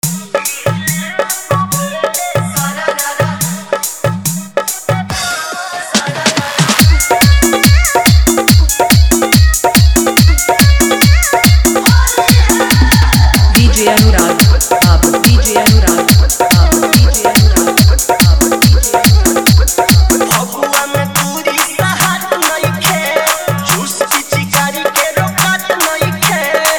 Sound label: Holi Hard Bass Mix